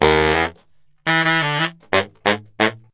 sax funk

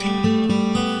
acoustic guitar picking; GUITAR LOOPS

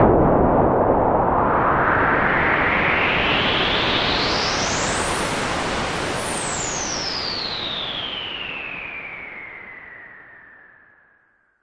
noise SH101, Noise Sweeps

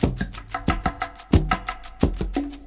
90 bpm forest spirit funk

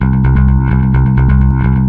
127 dangerous solid bassline, bass loops

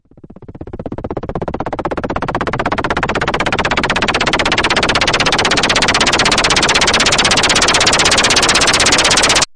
noise MiniBrute, Noise Sweeps

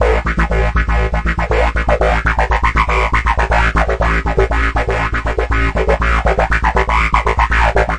120 rocking filter bass, bass loops

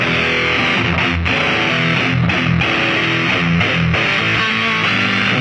stereo sludge loop, guitar noizes

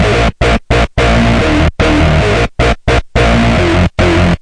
silly bass distorto riff; BASS LOOPS

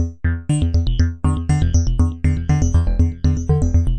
solid triangle wave synthline; SYNTH AND ELECTRONIC LOOPS

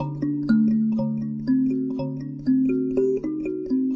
ambient kalimba; WORLD LOOPS

